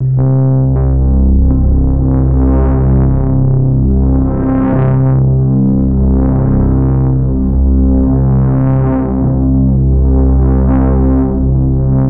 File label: sub; Void & Vista; Kontakt